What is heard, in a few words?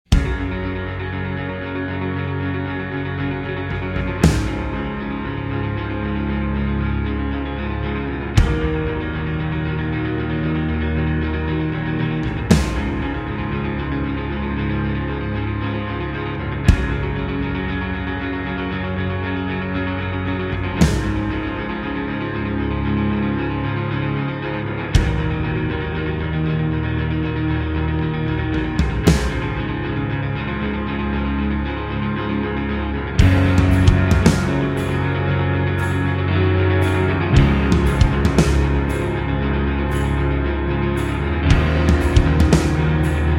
April (Instrumental)